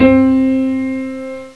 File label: piano c3